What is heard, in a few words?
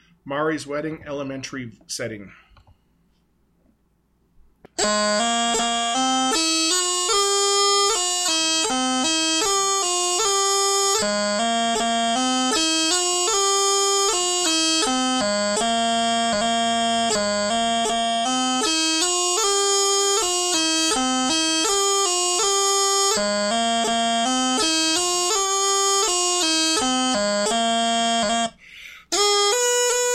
Practice Chanter, bagpipes; Mairis Wedding Elementary